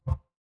ui beep

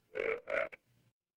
animal frog

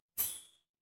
door doorbell

